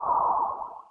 move; Fly For Insanity

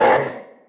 attack; Fly For Insanity

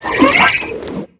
Dmg; FlyForInsanity

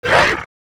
Dmg; Crohell; Fly For Insanity

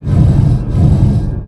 Big Fan Fast; FlyForInsanity

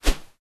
general popup in; Candy Crush Friends

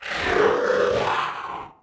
spirit death; FlyForInsanity

